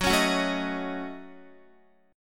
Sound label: F sharp Minor 6th F sharp Guitar; F#m6 strummed; x,9,11,11,9,10